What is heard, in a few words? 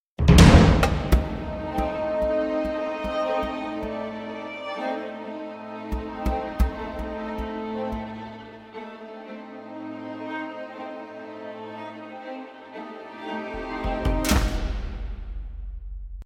Cave; Comic Spy Mystery